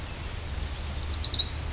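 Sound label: song yellow warbler maine; sweet-sweet, I'm so sweet; This is one I recorded in Augusta, Maine in July 2009.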